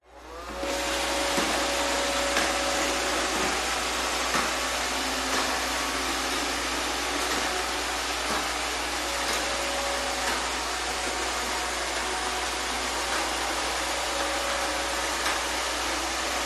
household vacuum robot operate vacuuming tiled floor room perspective